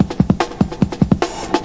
145 heavy strut stuff, drum loop; 141 to 160 bpm

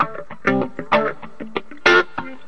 097 rhythm guitar onechord; GUITAR LOOPS

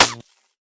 tight hit, Tight n Hard Kit; style kits

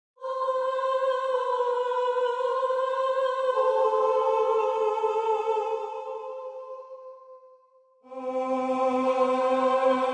fake choir piece, female singing; VOCALS and SPOKEN WORD

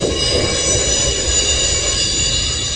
subway sound; Subway Sound Construction Kit

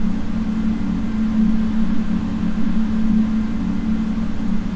noise pan, Atmospherics

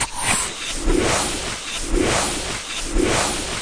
superstereo whoosher; Various Strange Sounds and Effects

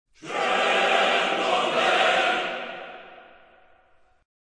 intense chorus chant, MALE SINGING; VOCALS and SPOKEN WORD

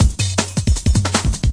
DRUM LOOPS and BREAKS, 157 bpm; round the circle; 141 to 160 bpm

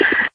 breath slide lower; percussive; REMIXABLE COLLECTIONS; Ze Franks Pain Pack; pain pack percussive and bips and clicks

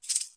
rattle, ethnic and world percussion; Rattles

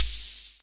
cym, Ace Tone Rhythm Ace FR-1

